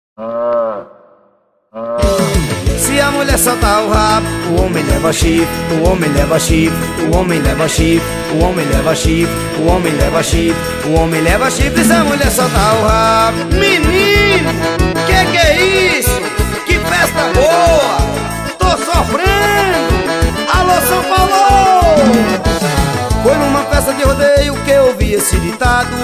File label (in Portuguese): forró